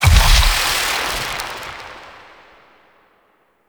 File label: Shadow Particle Death; Warframe; Duviri